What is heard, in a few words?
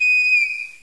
pipe